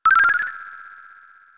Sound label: character extra power; SNES; SMRPG